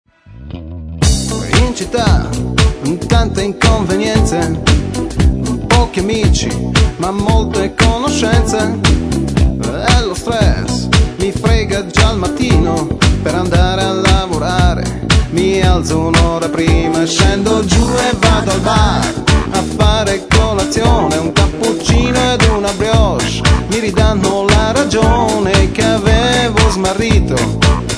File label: Funky